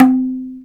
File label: MADAL, ETHNIC PERC; InVision Interactive; Lightware VOL-1